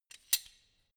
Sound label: wrench slide open soft; schrauben drehen hydraulik